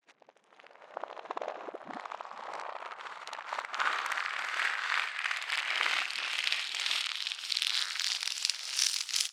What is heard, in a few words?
Riser Texture; One-Shots; SFX; Ghosthack; Color Bass Essentials